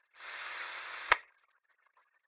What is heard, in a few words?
radio static